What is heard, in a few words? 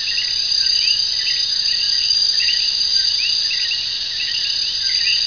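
Woods, Spooky Sounds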